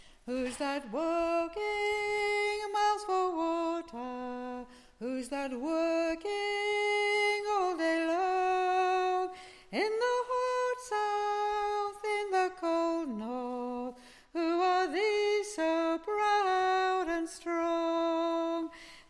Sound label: Tune